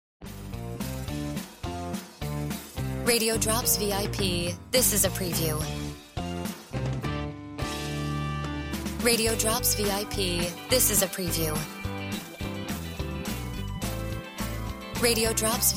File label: Music Bed